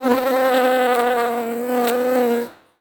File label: Amb Animals; Red Orchestra Ostfront 41-45